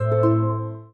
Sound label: pure music down, phone ui; Oppo A5s